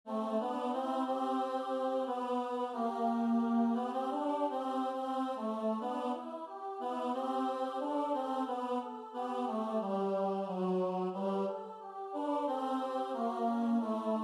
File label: tenor